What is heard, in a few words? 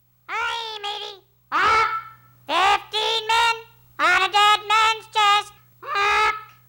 Parrot